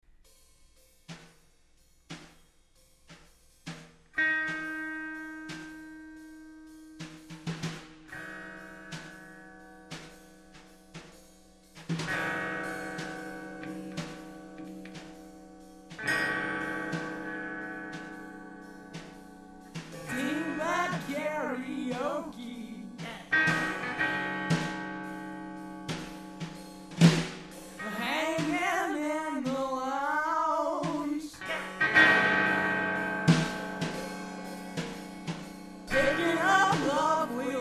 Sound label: Karaoke